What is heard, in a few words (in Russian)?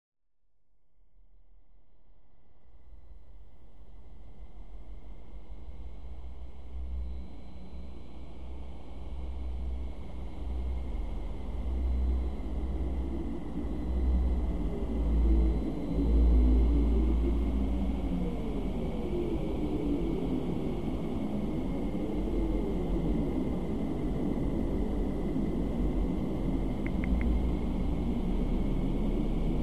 zvuki zemli